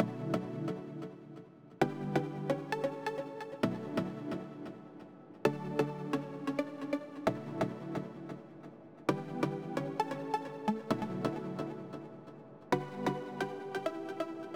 Delayed synth, 130BPM; stems; Pony Fan Music Remix Stems

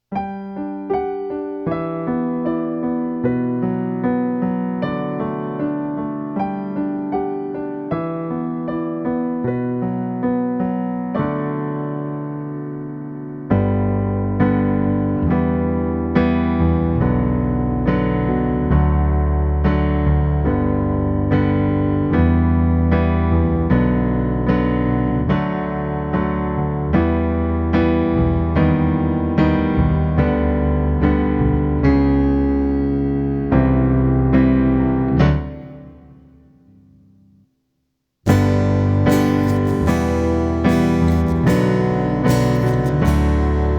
Karaoke

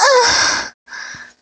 Moan

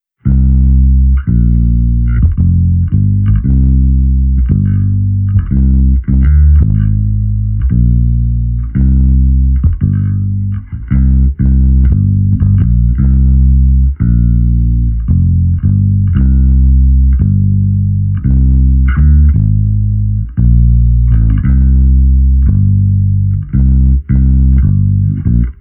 Bass, 85BPM; Pony Fan Music Remix Stems

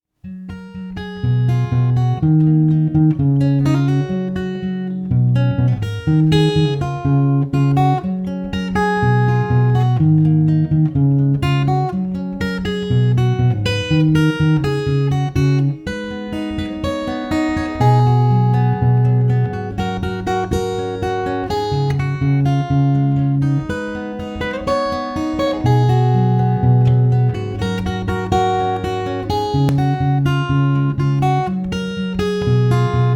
Classical Guitar Arrangement Cover

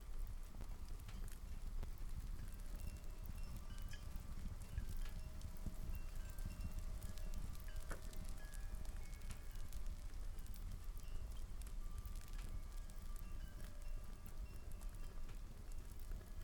Witch's House Soundscape

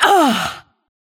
Grunt; Lewd SFX

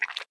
Sex Slide; Lewd SFX

